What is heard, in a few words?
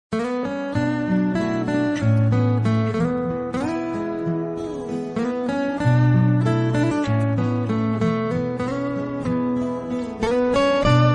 love bgm; Surya Son of Krishnan